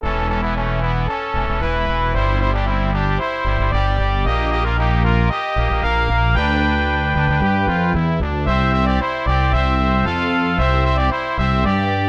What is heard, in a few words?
Fanfare; Ultima